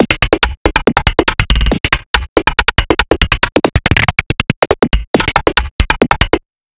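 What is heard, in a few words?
rhythm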